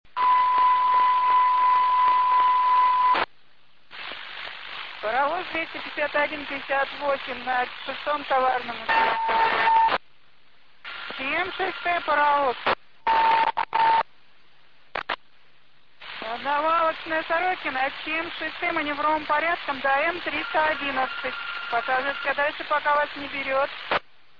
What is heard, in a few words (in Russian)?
parovoz